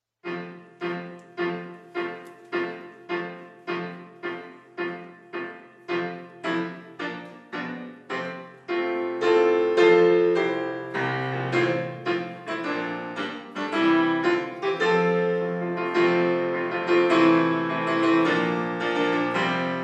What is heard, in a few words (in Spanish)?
piano para acompañar; Audio Piano para acompañar ao Himno; Divino Maestro